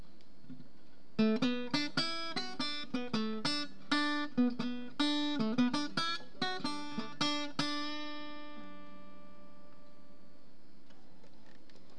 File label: harmonic steel